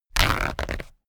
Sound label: paper towel roll sheet tear off